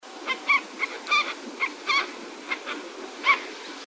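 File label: ruff flight flight call; doell see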